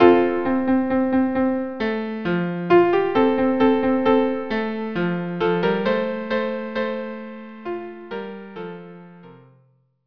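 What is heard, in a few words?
minuet violin cello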